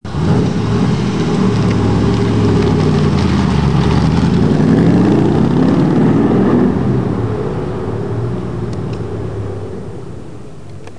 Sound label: aston martin v8; bruits de moteurs